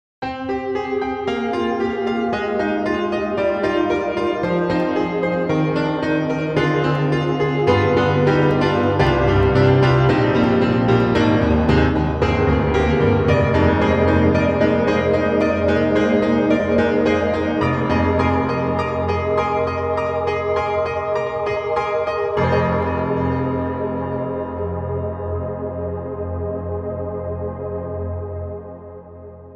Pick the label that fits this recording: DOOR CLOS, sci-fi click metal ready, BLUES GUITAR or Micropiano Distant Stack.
Micropiano Distant Stack